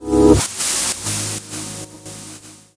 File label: Chords Tones; Equipped Music; Loungin House